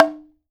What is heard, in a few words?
OKAWA, JAPAN PERC; AKAI S6000 CD-ROM; WorldSounds